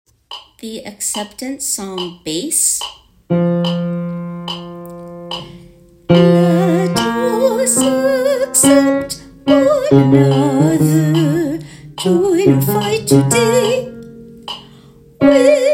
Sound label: Bass; Broadway Shabbat